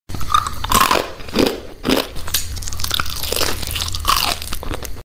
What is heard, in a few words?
Crispy Fried Chicken Eating